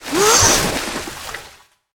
gasp

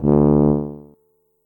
tuba note

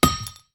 grenade bounce, weapons

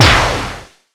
lasergun fire, weapons

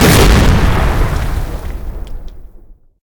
rocket impact, weapons

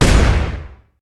fire, weapons

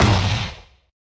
electro fire, weapons

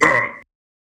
pain

